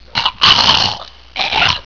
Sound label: zombie pain; svencoop; hunger; zombienew